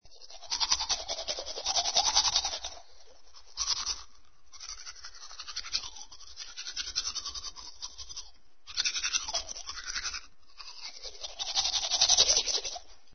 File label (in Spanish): Dientes lavar dientes